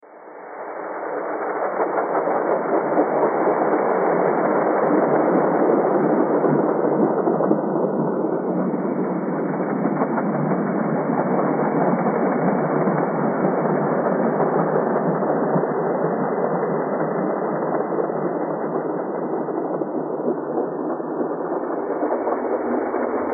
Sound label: train thread all